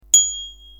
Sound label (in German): Fahrradklingel retro; Kompass